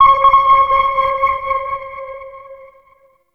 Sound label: Radar Ability Cast; Warframe; The New War; Drifter; Abilities